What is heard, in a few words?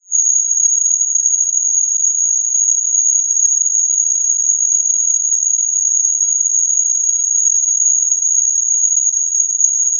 ofdm channel